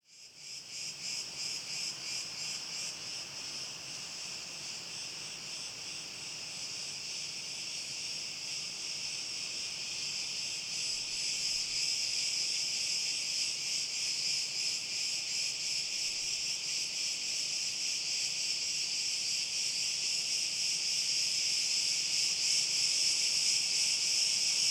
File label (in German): Grillen Rein